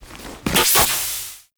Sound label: Navigation Activate; Warframe; Hub; Liset Machines; Tenno Navigation